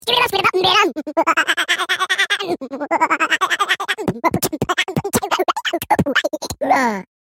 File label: goofy laugh